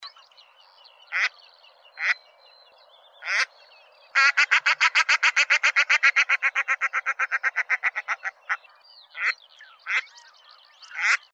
utka

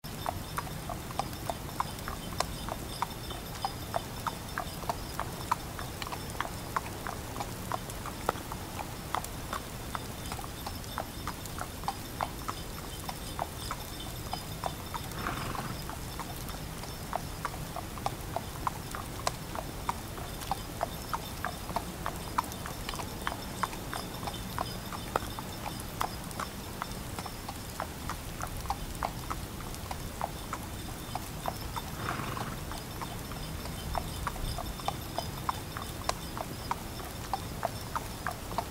sani s loshadiu

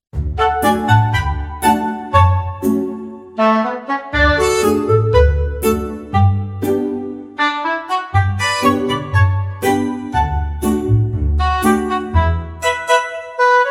cartoon, schastlivaia detskaia muzyka

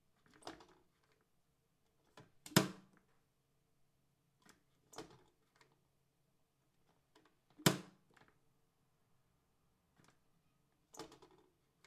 krysh elchainik

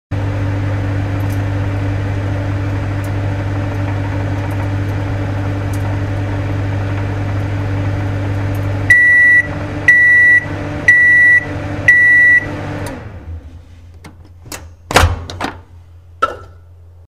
mikrov gromkii zvuk

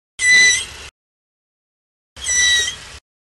lamantin

